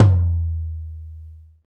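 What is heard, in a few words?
tom low, Roland; Club-50 - Foundations Roland; Ext. Snare 4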